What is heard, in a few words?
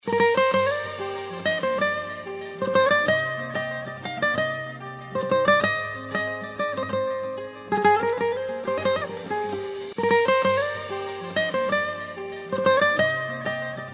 guitar gudok ringtone